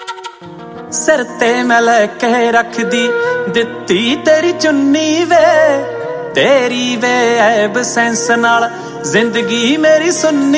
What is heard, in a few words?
Latest song Ringtone